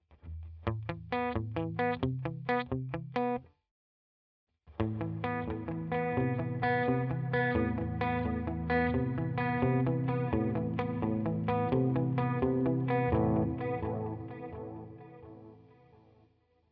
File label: UAD DelVerb PrecisionModHall